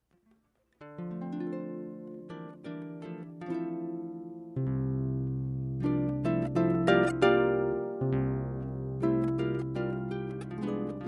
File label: harp; Classical